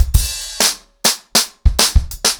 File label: 100BPM, ACOUSTIC DRUMS